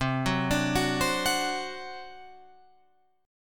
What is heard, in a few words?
C Suspended 2nd Flat 5th C down Guitar Open F; Csus2b5 arpeggiated